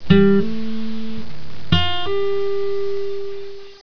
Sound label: hammer